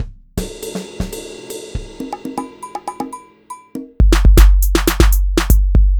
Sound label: GroovePizza 120bpm